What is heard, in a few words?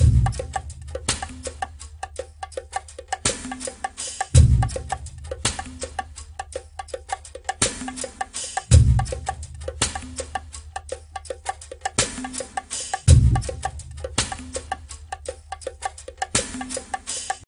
Jungle Level